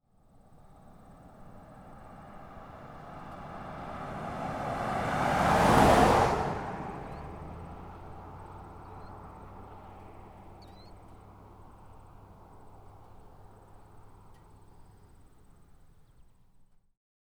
Car Pass Vehicles Land

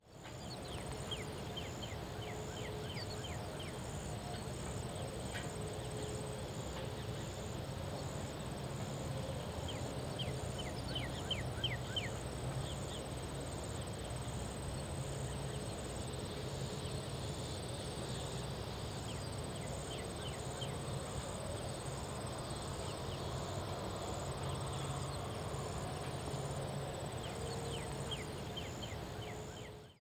Country Field Outdoor Ambience

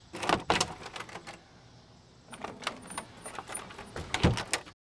Opening Closing House Door, Household

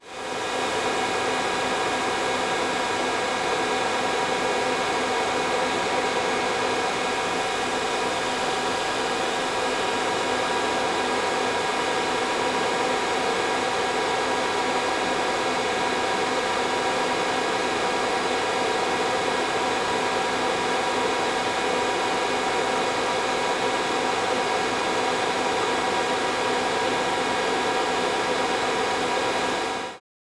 Powerful Computer Industrial

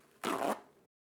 Paper Towel Tear, Household

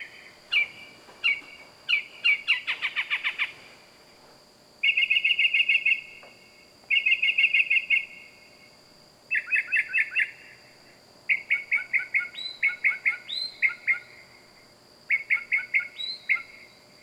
Loud Night Bird Animals Wild

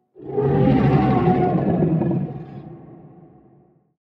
Big Distant Roar Animals Wild

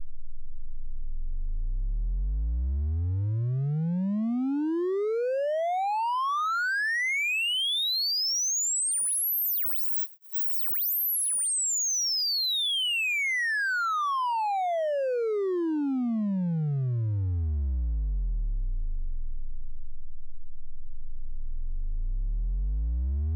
Sine Sweep; Sound Design

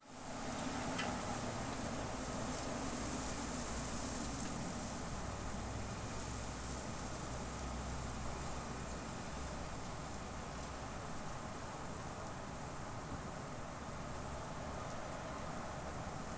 Windy Spring Day Outdoor Ambience

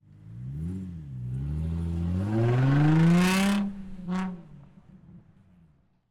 Car Show

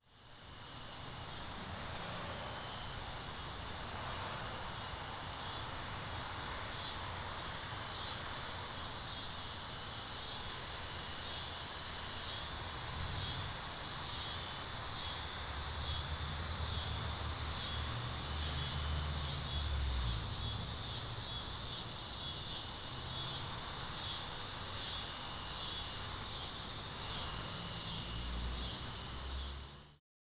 Summer Neighborhood, Outdoor Ambience

